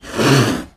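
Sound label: Ocelot Hiss Curt Sneeze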